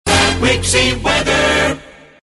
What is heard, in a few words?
WEATHER jingle